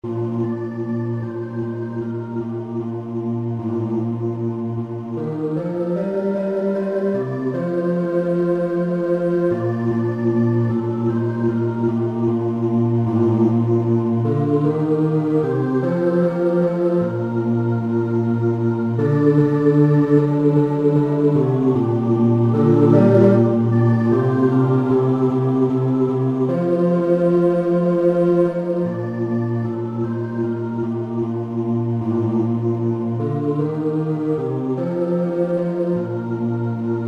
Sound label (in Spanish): BAJO